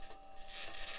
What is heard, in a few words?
mic, mars2020 edlcam